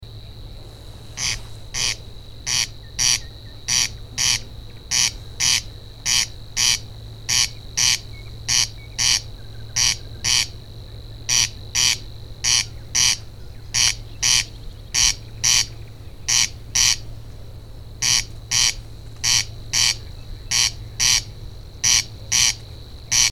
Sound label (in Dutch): Kwartelkoning, Brandenburg